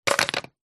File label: foley metal pole solid drop onto stones